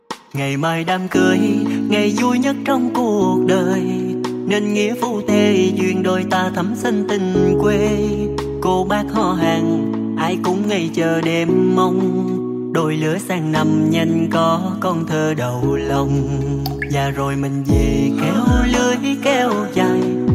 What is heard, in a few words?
Lofi